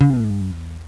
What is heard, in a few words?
EATEN